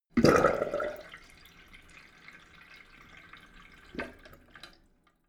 sink drain hard short

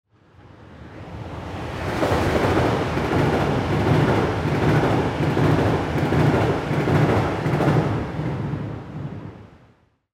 train passing very quickly

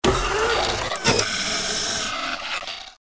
electric door

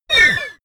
sci-fi laser shot

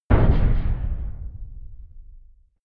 big metal impact low soft

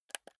console controller joystick press

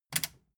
metal button click power on fast

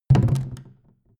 canister empty put down

